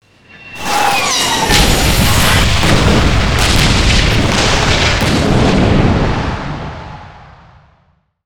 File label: small meteorite magic